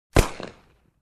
Gun Shot with flutter echo

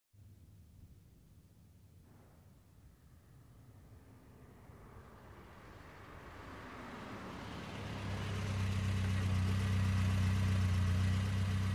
Car approaching and getting out

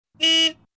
Old Car honking

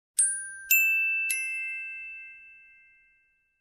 Glockenspiel jingle melody

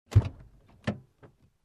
Car Door Open

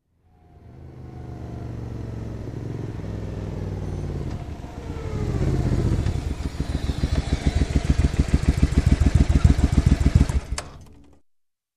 Motorcycle approaching and parking

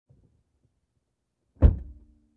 Closing Car Door

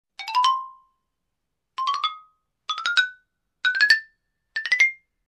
Xylophone cartoon melodies

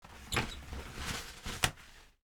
Toilet paper being pulled

